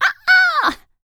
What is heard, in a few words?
Vocal, R&B & Dance, FEM. HUMAN; ILIO; Vocal Planet VOL-4